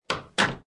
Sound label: door Close